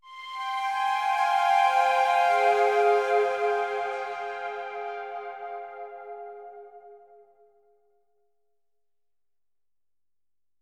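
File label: Synth F minor; torchlight iii; ps4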